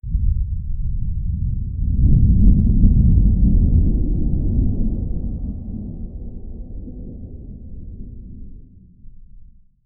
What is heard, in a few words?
a deep rumbling thunderstorm rolling across the sky